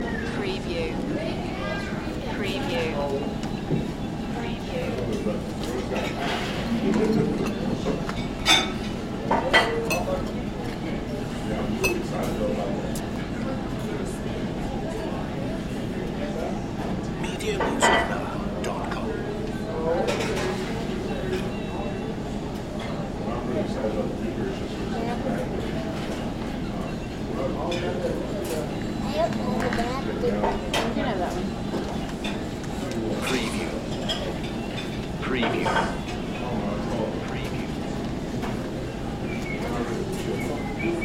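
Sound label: Casual Restaurant Ambience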